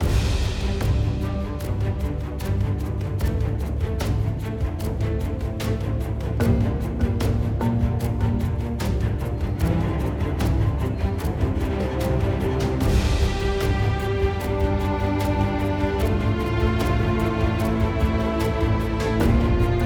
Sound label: Music Perc Post Race 75bpm; Pm Perc Post Race 3 75bpm Game Mix; Forza Motorsport 6: Apex